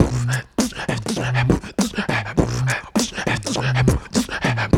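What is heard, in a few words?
108 bpm, scratch groove; Scrth Groove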